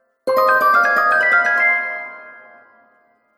Elf Harp Magical Gliss; Magic Sound Effects

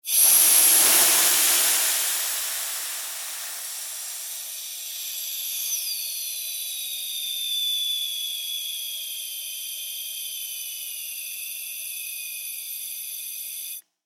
Industry Air Can Expel Slow, hisse; Hisses

